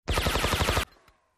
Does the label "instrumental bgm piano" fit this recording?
No